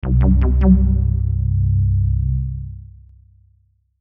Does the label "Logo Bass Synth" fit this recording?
Yes